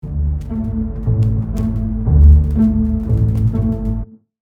Merlitons Pizz, a pizzicato ostinato pattern on violas, cellos and basses.; Rule of Three; The Nutcracker; This is a standard oom-pah type of figure